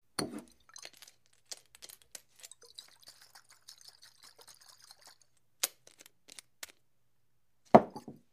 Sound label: Bottle Shake Pick Up